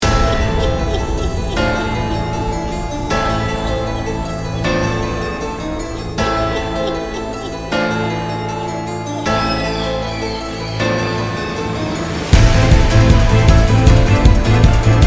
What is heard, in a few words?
Raid Boss; Mist Train Girls